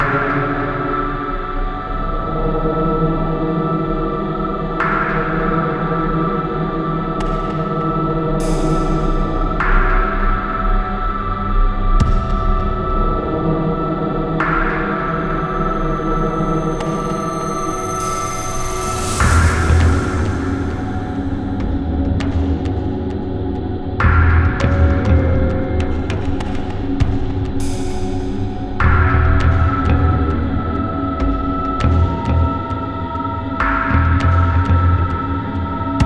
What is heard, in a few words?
Ambient Strain; head as code soundtrack